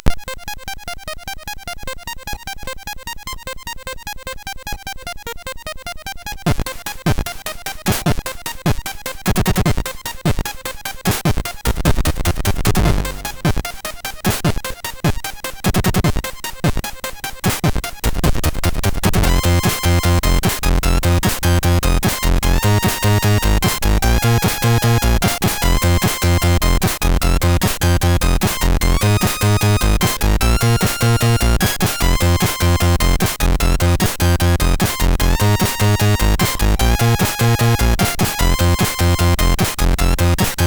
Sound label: Remix, MOS6581R3; Pa-Pa-Pa; hvsc